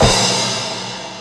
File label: Crash; 轻音少女